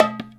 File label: NAGARA; InVision Interactive